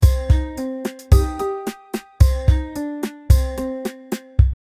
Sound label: playful and sarcastic, quirky